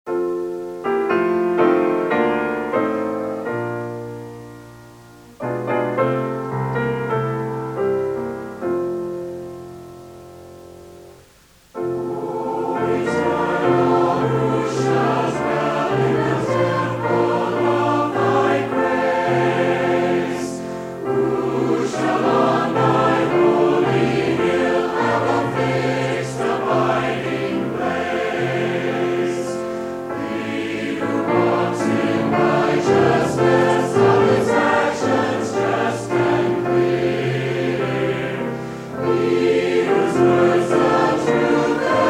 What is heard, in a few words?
hymnal, chorale; Church Materials; AC Chorale; Who Shall Dwell On Thy Holy Hill